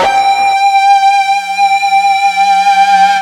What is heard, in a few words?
FUZZ G 4, Guitar; East West; Steve Stevens Guitar Samples Collection